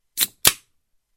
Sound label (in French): Ouvrir une canette